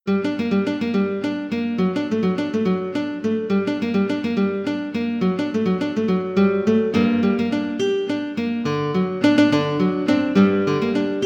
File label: synth